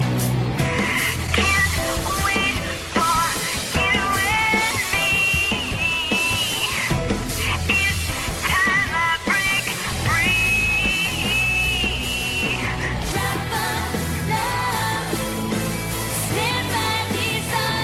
Trap

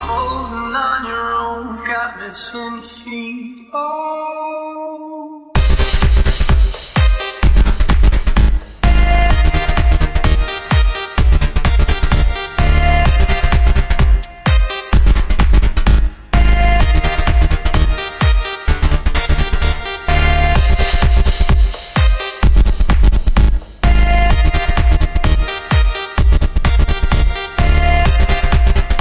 Rockin Party Tune

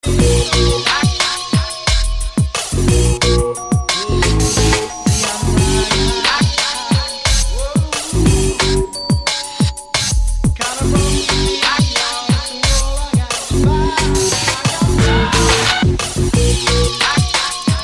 Drum And Bass

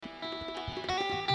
Baloch

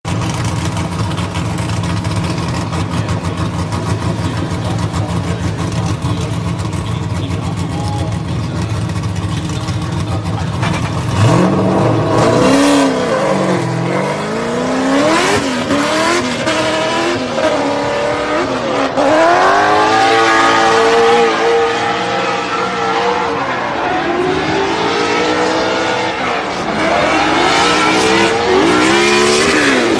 Eurofighter; E92

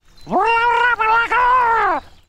Bird Call

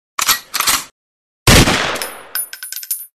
Shotgun

